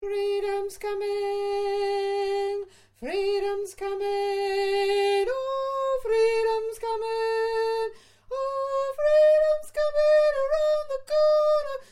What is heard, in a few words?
solo tenor